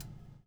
BUBL; Spectrasonics - Backbeat

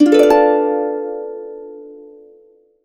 harp chords, B dim7; USB Soundscan vol.62; Complete Harps; AKAI

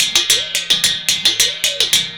110 WATER, Indian Traditions; USB Soundscan vol.45; AKAI

